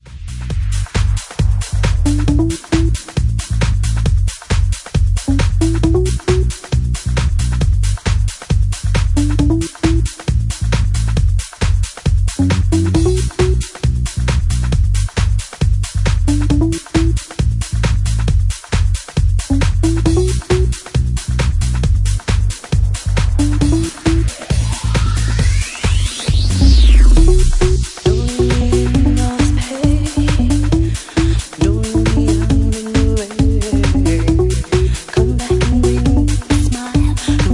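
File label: Bassline Mix, Bassline House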